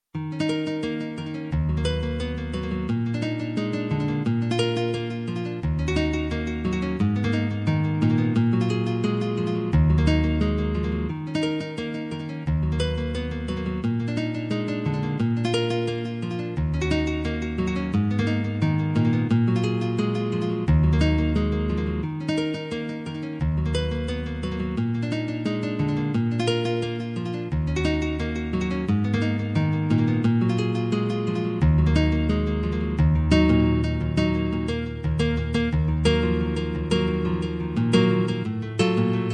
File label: guitar